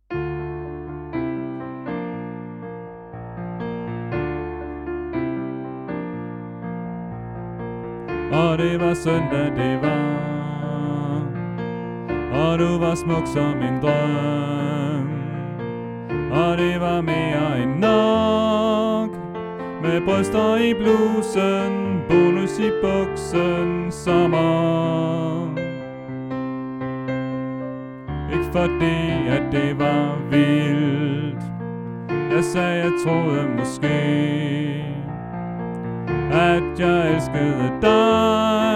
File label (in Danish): Sopran